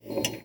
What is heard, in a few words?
pinball pin